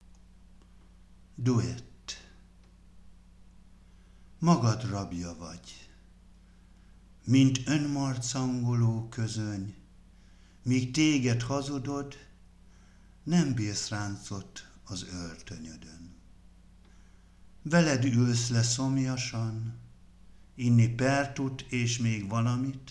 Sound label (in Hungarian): Duett